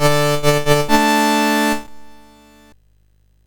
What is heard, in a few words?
sid MOS6581R2; California Games